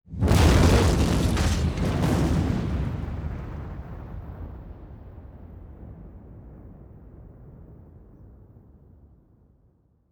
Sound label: Boss MORTE; Okunoka